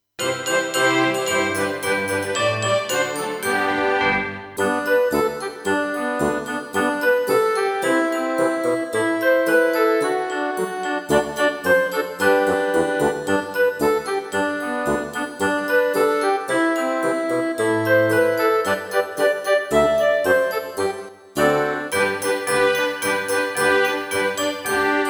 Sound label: Jingle Bells